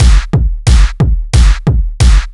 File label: Boom Chuck